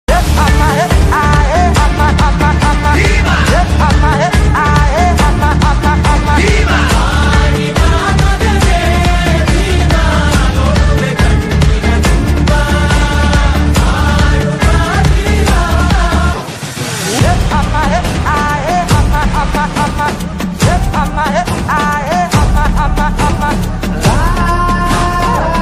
Kannada Ringtone Kannada Movie bgm Ringtone

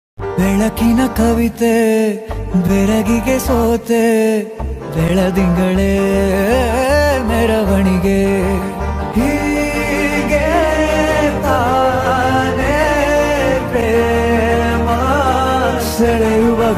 love ringtone music; banaras

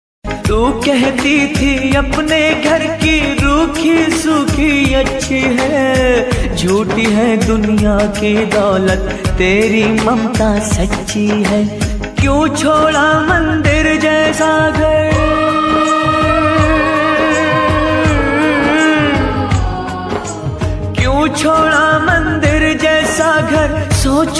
punjabi ringtone

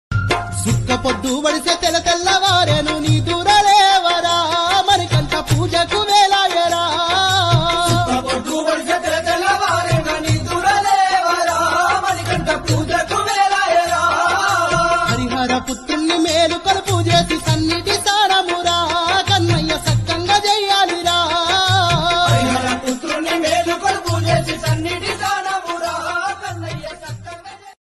bhakti bgm bhakti ringtone; ayyappaswamy

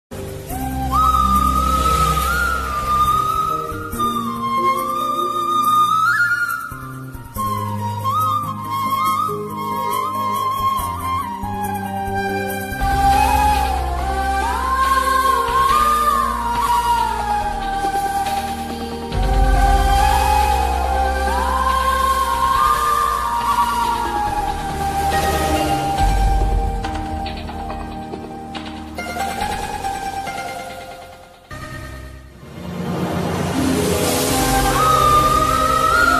love bgm ringtone; ghilli

